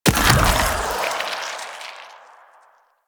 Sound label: Molt Cast; Warframe; Warframes; Saryn